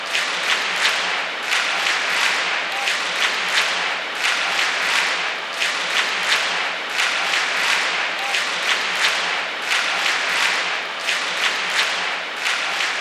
Sfx Winter games

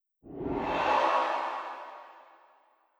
Morale

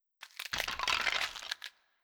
Catapult Bones

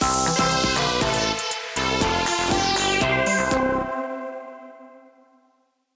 Transition

